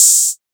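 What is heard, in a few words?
open hat, Cymbals; Drums; Ghosthack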